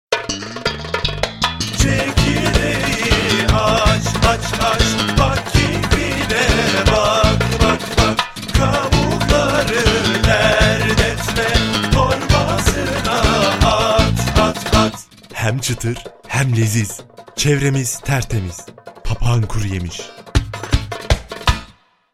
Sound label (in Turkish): kuruyemis jingle; papagan